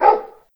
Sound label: Shibe bark; butterflysoup2